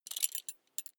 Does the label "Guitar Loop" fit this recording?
No